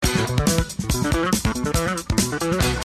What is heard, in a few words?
Bass